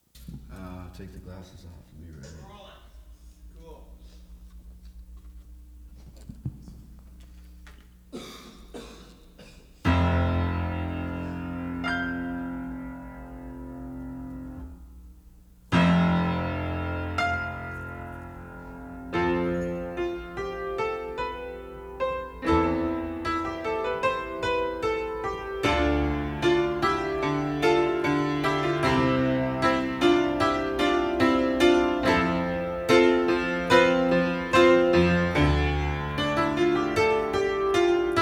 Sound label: Piano Version; 1986 Sound City Session